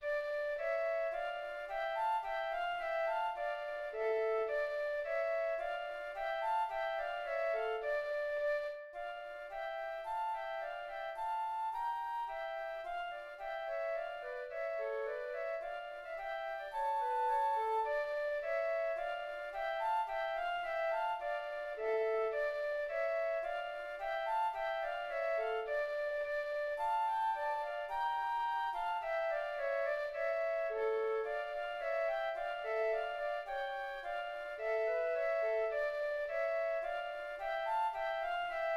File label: tambourin for two flutes